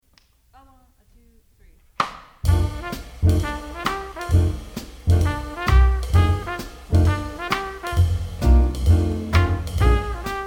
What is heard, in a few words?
bass; all state jazz etude recordings